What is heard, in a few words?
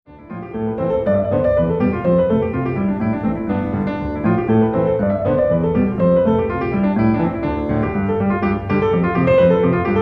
celtic dance, piano solo